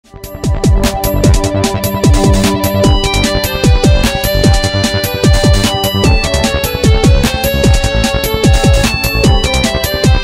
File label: Music Pack, Pop Music; Marshmallowiiz Music Pack